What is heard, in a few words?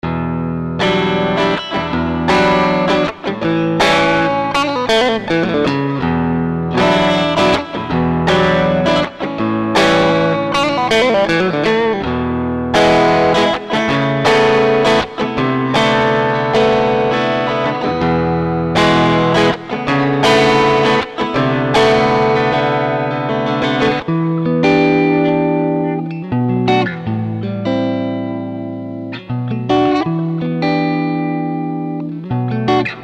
Dirty Guitars